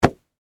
Canvas Tarp Pat, Foley, Canvas, Tarp, Pat, Impact, Soft, Fabric; Rustling; BlastWave